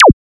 impulse response

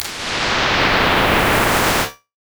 abstract impulse response; reverb

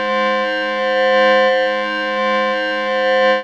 flute c4 adfbam

